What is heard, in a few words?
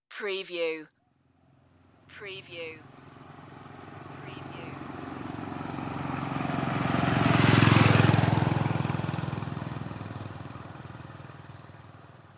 scooter passing